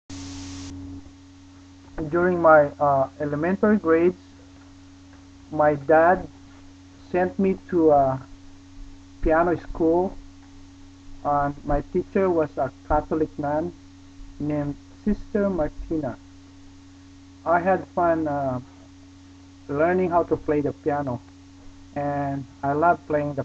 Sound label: piano recital (elementary)